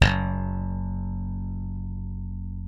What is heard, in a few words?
F#1, slap thumb; Xsample VOL-1